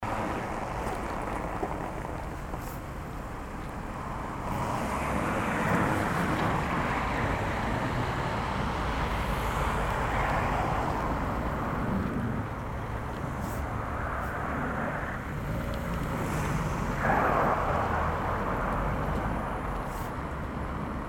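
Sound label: sound of road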